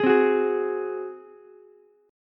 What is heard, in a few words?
F sharp Suspended 2nd Flat 5th, Ukulele; strummed; down